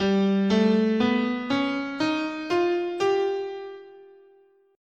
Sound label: G Whole Tone, scale